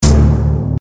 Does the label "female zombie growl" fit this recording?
No